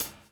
DOWN, DOWN KIT 78

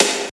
SNR, Tekno Industrial; East West

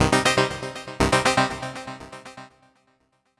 Minor Arp; Dr. Fink's Funk Factory

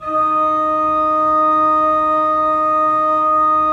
3D Organs Pipe Mix Celeste; E-MU Producer Series Vol. 5 – 3-D Audio Collection

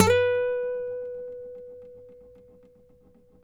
NYL B, FLAMENCO NYL; Spectrasonics; Hans Zimmer Guitars VOL-2

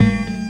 FX, Futurist Drum & Bass; Sonic Foundry (Sony Creative Software)

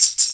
TAMB; InVision Interactive; Percussion Slam

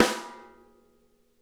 SNARE RIM; Sonic Reality; Interactive Drum Kits

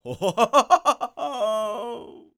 LAUGH, World Voices, ANDES; Spectrasonics Vocal Planet

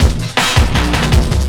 Drum & Bass, 160 BPM

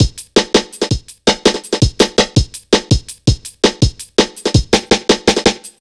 165, drum loops, drum & bass; Total Drum&Bass

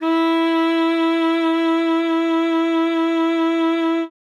sax e4, Saxophone mezzoforte; Session Instruments

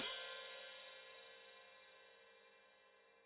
Hat Foot Open, Hi-Hat; Cymbals Stereo; Deeply Sampled Drums

